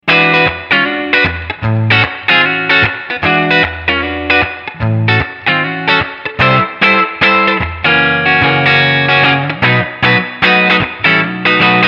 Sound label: Eleven Rack Black Panel Lux Vibrato